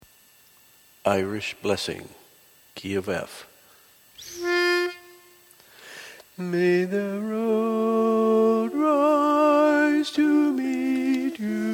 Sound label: Irish Blessing Lead